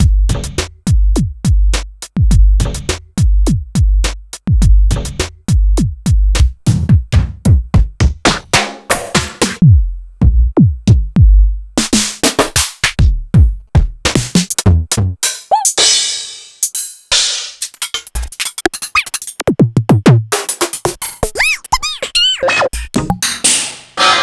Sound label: Korg Triton Under Hard Kit